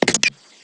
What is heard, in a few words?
equip; downworld